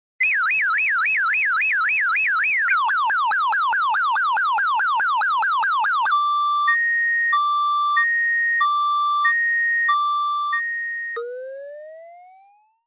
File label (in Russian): Signalizacya